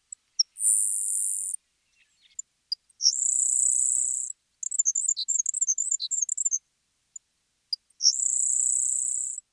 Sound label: Grasshopper Sparrow